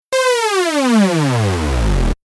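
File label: synth glide glissando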